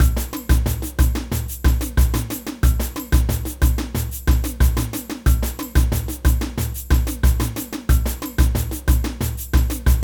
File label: samba; samba fanga